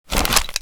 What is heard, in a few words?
ammo